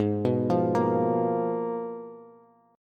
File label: Ab Minor 6th Add 9th, Ab, Bass; arpeggiated; JGuitar